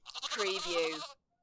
Animal Sheep Lamb

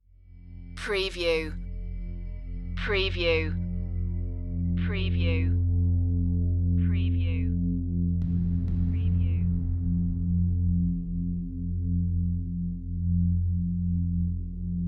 drone and deep drum

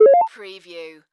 Multimedia Chime

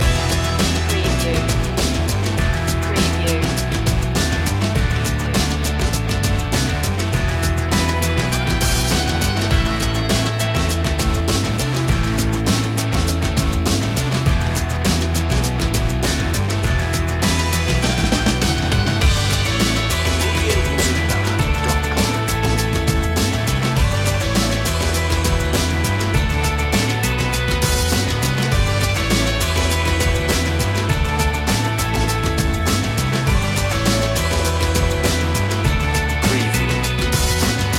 underscore loop

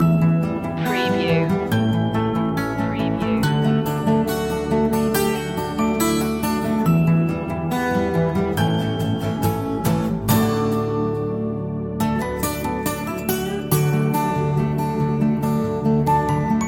earthbound loop

